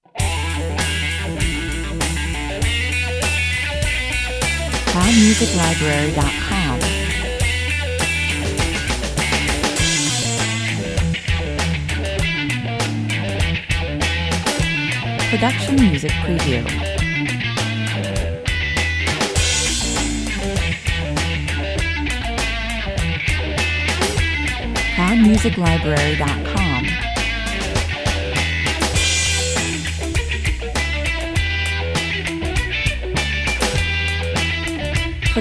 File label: Flaming chops wah-wah guitar, bass and drums, 100 bpm; hendrix pastiche